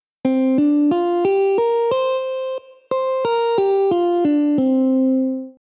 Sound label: Suspended Pentatonic; scales; ragajunglism